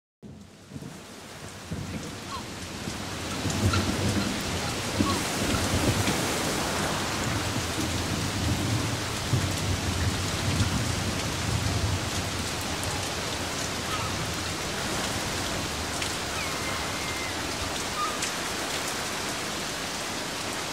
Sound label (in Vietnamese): tieng mua tren bai bien; con mua